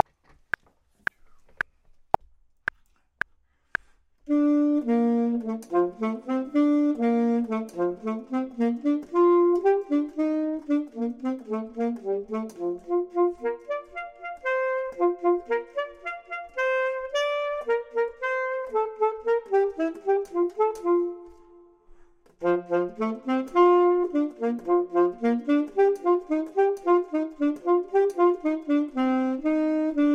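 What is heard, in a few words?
bottom, Saxophone and Clarinet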